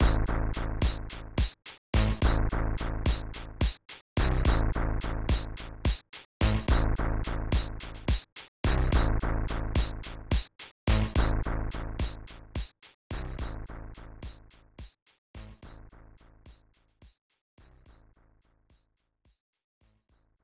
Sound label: Beat Box, Waiting to Launch; Pinball Dreams; SNES